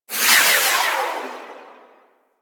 Artillery Barrage Projectile; Weapons; Powers; Warframe; Archwing

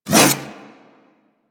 Kunai Whoosh; Stalker Kunai

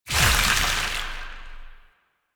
Void Release; Weapons; Warframe; Duviri; Incarnon Adapters

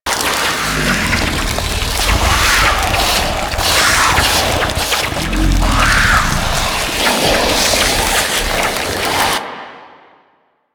Modular Secondary Barrel Loop; Warframe; SU Modular Guns

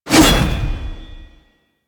Flame Spear Throw Fire C, Grineer, Weapons

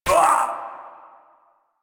Voice Enemy Hit Standard Noise; Warframe; Caliber Chicks minigame

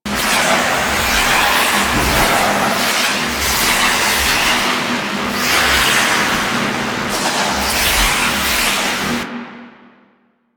Launch Tube Loop; Ambience; Interior; Warframe; Orokin

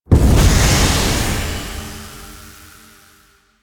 Survival Capsule Activate; Warframe; Zariman

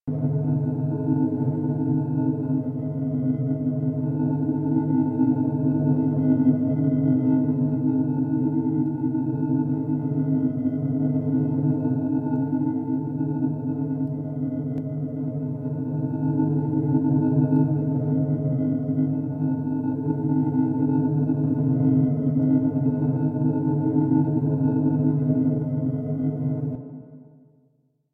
Operator Room; Warframe; Liset; Hub; Lower Deck

